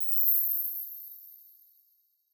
fairy dust

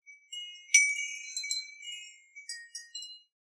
Wind Chimes B; SoundsCrate

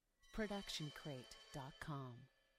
crash cymbal 16 inch scrape

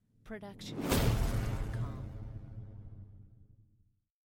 Sci-Fi Charge Up and Blast; SoundsCrate

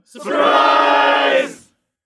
surprise; soundscrate

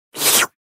lick; soundscrate

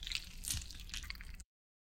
stylized squish; soundscrate

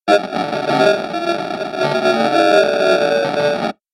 robotic glitch

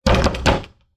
wood door bangs outside 3 consecutively; soundscrate

